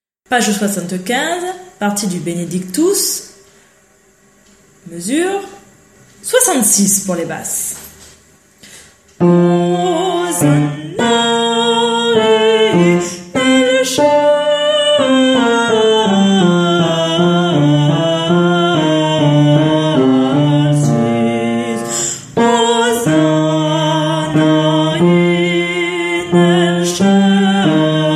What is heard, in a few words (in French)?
Basse; Requiem; Benedictus; Mozart